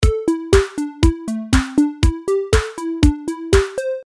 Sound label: delay